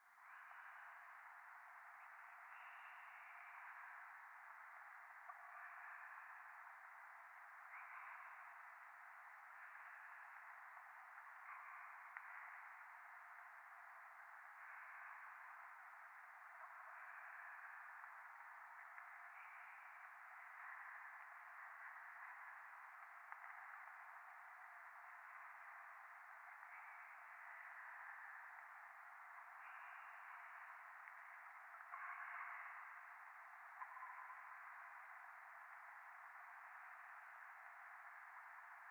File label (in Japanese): 左耳密着処女喘ぎ 雨